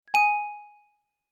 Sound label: notification sound; chat-app